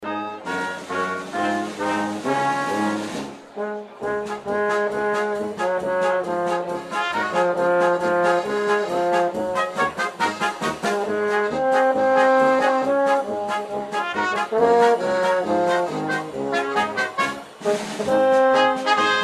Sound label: German Band; Boston German Band